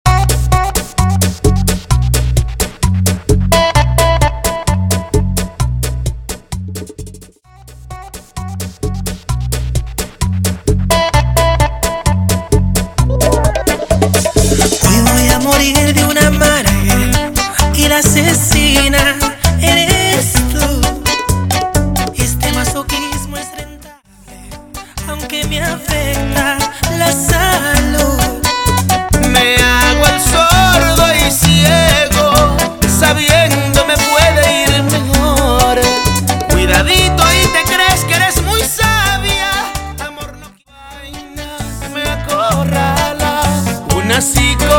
Bachata 130 bpm

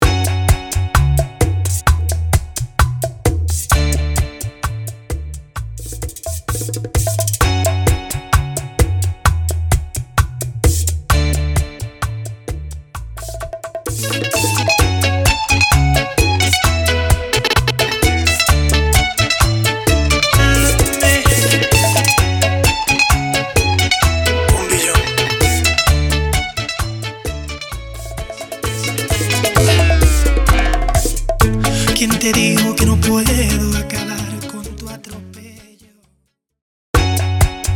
Intro Outro Break 130 BPM

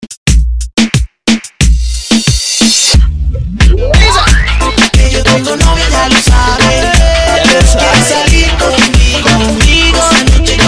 Intro Outro 90 BPM

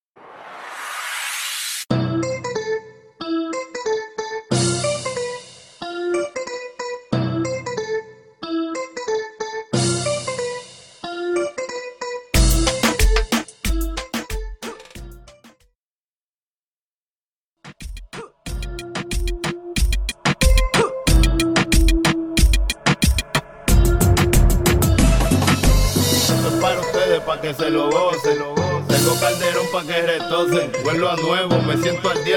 Intro 92 BPM

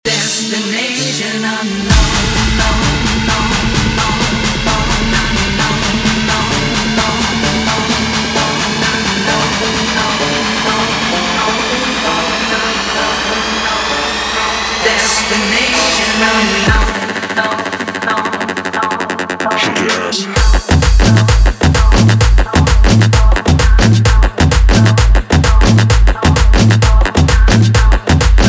mashup 130 bpm

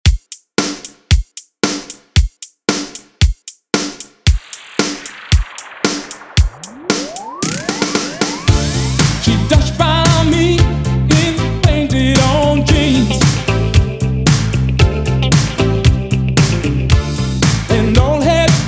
114bpm

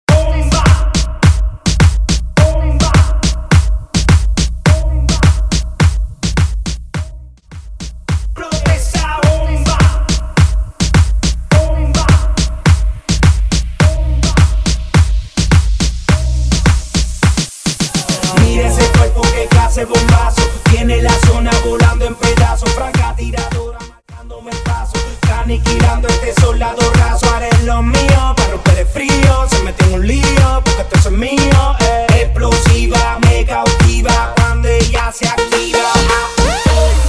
Trans Moombah To House 105 To 128BPM; transition moombathon to house

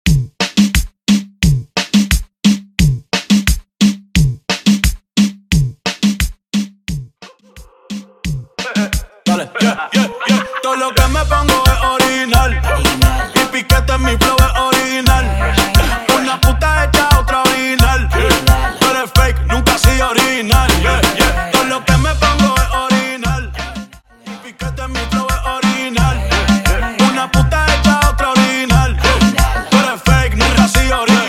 Trans Reggaeton To Trap 88 To 75.5BPM; transition

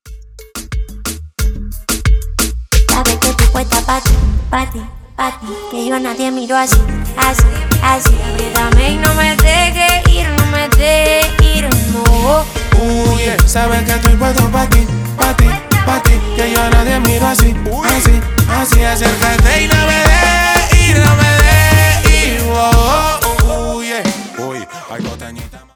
Chevere Intro Verso 90bpm

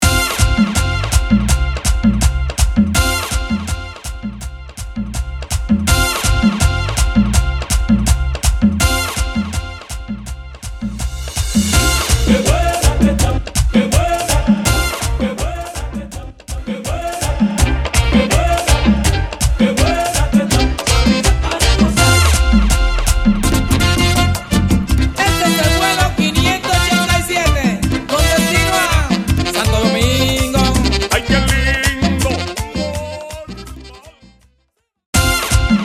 Intro Outro Break 164BPM

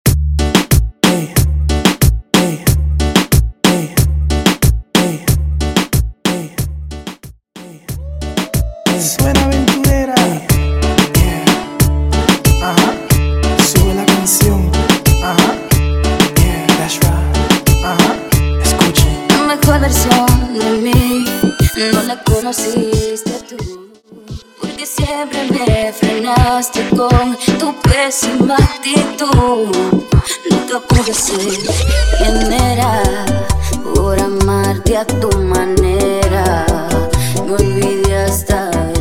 Transicion Reggaeton To Bach; transition

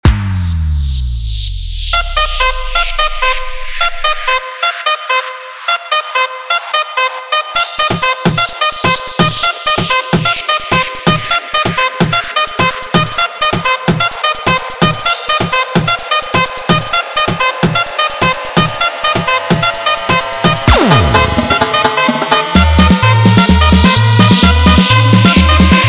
saxo groove 128bpm; ritmos modernos; remixer peruano

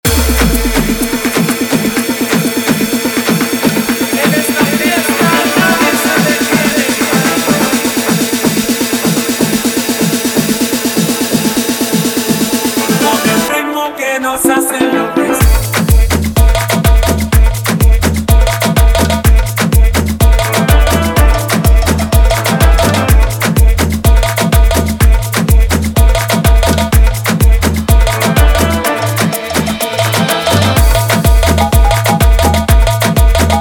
afro remix 125 bpm

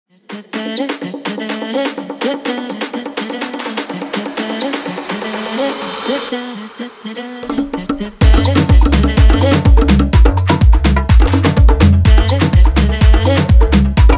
afro remix 125 bpm

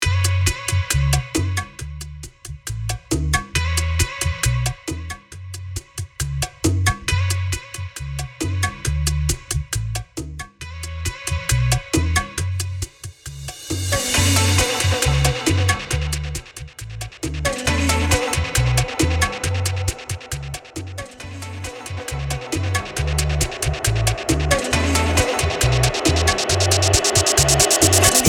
intro outro break 136 BPM

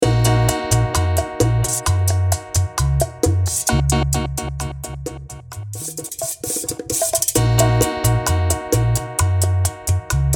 intro outro break 131 BPM

